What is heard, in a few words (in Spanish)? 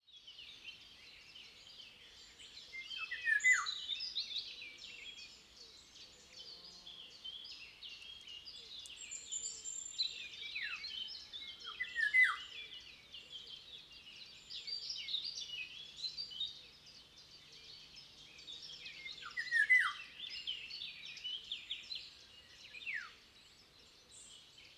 Oropendola europea Oriolus oriolus; papafigos